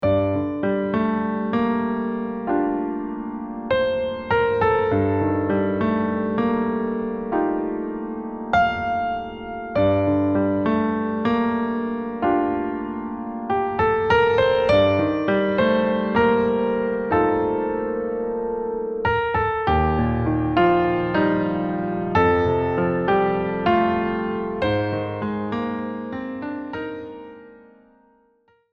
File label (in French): Ballade jazz